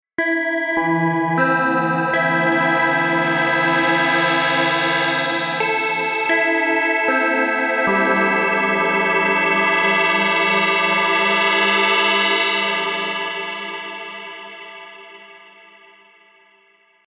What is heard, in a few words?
Progressive Freeze MPE; Philippe Loiseau